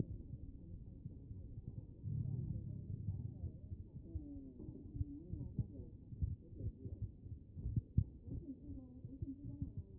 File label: A CHEST; B MODE